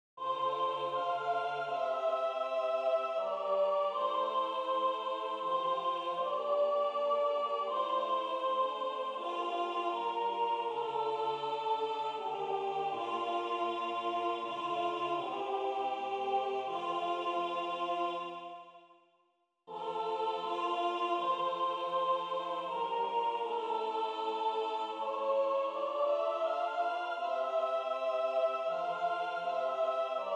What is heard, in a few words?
Revecy Teil 3 Soprano; Revecy venir du printans Teil 3